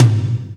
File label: TOM TOM, Roland